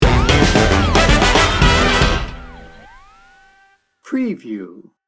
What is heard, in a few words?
memphis road trip sting bumper